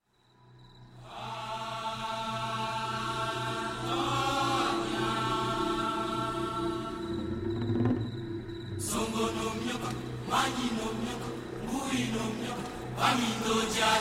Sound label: Drums in the Forest